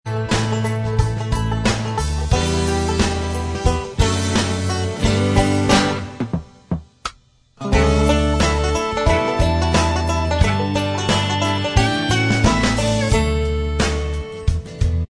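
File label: instrumental track, backing tracks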